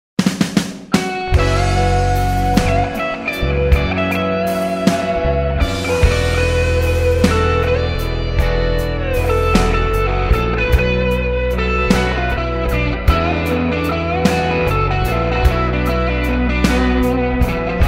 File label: Blues